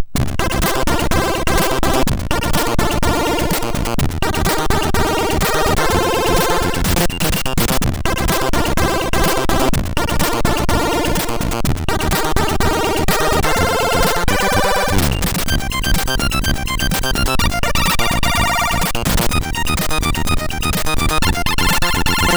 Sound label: sid MOS6581R4; Chiummo Gaetano; Tower of Rubble